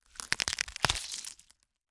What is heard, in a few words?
gather meat